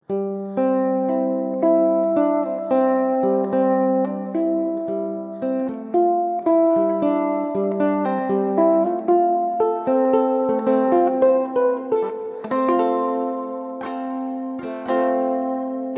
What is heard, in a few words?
ukulele